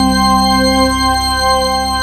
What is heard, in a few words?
CHURCH C2, SD1 PADS; East West - Global Sounds